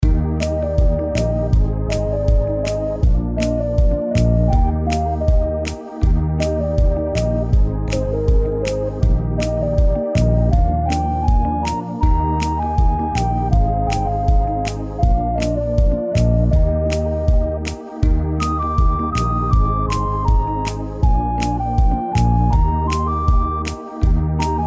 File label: MUSIC IN-GAME; Twin Hook; GMTK